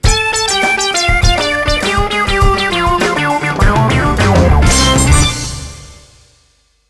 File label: Lose Stinger; starsky hutch gc